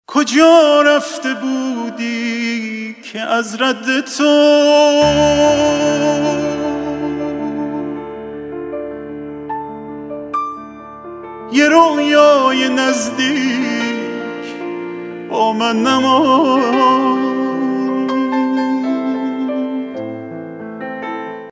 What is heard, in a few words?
Ringtone